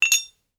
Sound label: empire game foley crafting metal silver bar clink clank hit